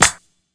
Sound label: Clap snares